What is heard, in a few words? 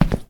Tile long; Devour 2021 gamerip pc macos